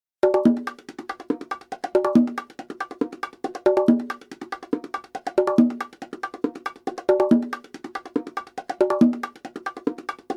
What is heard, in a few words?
Congas neutral; magnetismus 2